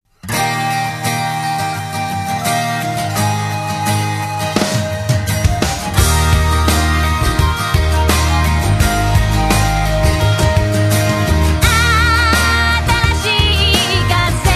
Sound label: Acoustic Ver.